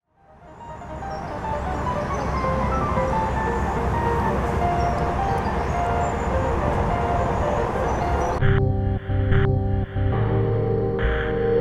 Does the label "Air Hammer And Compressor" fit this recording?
No